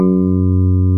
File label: DIGITAL SYNTH; OMI - Universe of Sounds